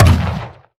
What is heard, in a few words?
towers cannoneers basic Attack